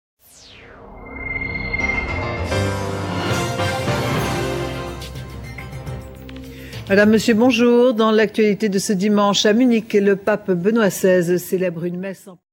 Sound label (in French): generique; france2; 13 heures we